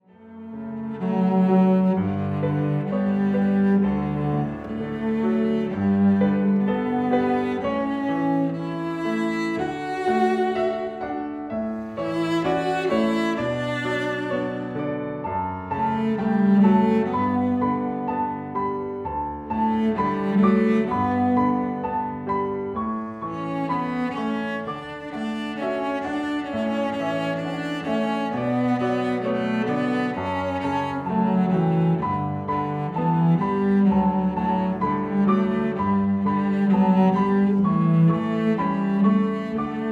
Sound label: Pachelbel Canon in D